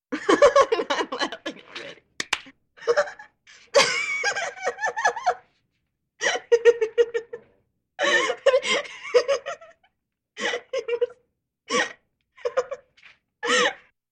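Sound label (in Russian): женщина смеется женский смех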